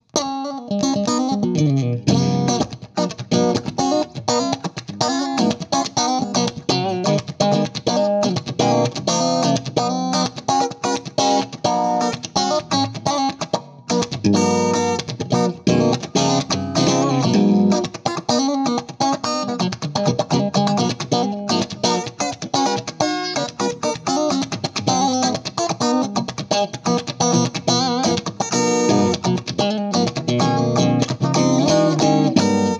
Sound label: Fast Clean Funky